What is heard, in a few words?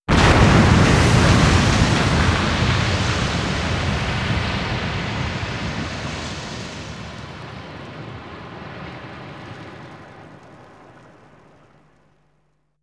Boom; Hex